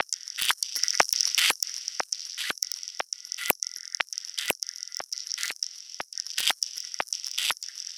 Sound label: Structured Rhythm, IDM Rhythms